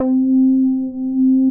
Synth Lead; Mookie Lead; Ableton; Beat Tools

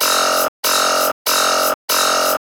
Clav; Frogger; Ableton; Breakbeats by KutMasta Kurt

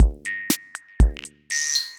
Kit-C78, Effected Drum Kits; Drum Machines; Ableton; Factory Packs

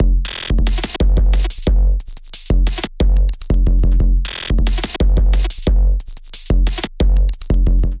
Kit, Drums; Beta Fish Kit; Ableton; Factory Packs; Glitch and Wash

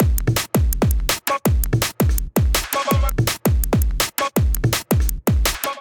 Kit Melodic 165 bpm; MIDI Clips; Drums; Blowin Kit; Ableton; Factory Packs; Skitter and Step

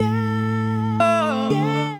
Basic Vocal Racks (A min); Voice Box; Harmonic Vocal Racks

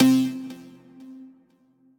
synth lead; Punctual; Ableton; Glitch and Wash

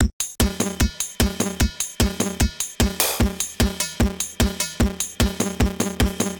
150 Beat, Drum Machines; LD Compuspank; Ableton

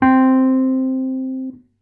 Basic Wurly Amp, electric keyboards; Wurly Piano; Ableton; Factory Packs

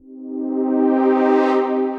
Synth Deep Chord; Pads; Ableton; Skitter and Step

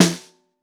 Bottom, Snare

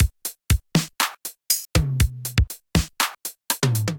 707 Kit, Drum Machines; 707 Flight Time Kit

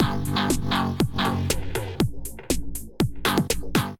Splash Mountain Kit; Drums; Ableton; Factory Packs; Build and Drop

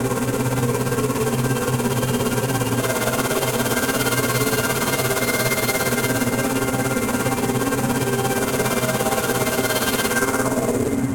Fearful Glitch - Cmin 86 bpm; MIDI Clips; Tonal; Atmospheric; Ableton; Factory Packs; Mood Reel

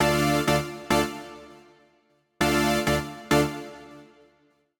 Switched On DCO (Dm) 100bpm; Music Loops; Ableton; Factory Packs; Singularities

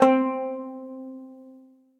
Ancient Greek Lyre; Multisampled; Ableton; Mood Reel; Placid Plucks

